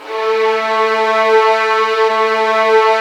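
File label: violins, arco, slow; String Master Series; Vlns 2-5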